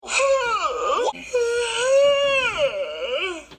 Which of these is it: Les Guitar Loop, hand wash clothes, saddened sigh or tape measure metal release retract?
saddened sigh